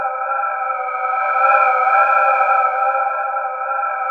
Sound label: WOBBLY, ESOTERIC PADS; Zero-G Ambient 1